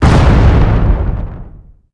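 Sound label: exp large generic, explosion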